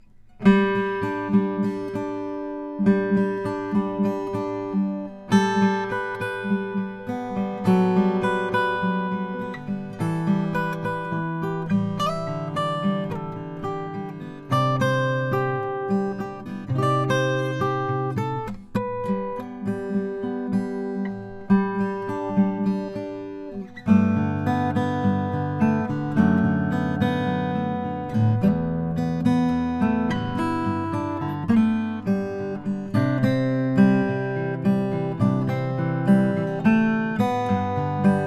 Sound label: bourgeois om at madagascar rosewood